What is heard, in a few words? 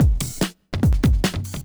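drums, Hardcore Breakbeat, 145, C minor; Big Fish Audio; Hardcore Breakbeat Action; Decimater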